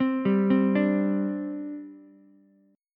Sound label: C Suspended 2nd C Ukulele; Csus2 arpeggiated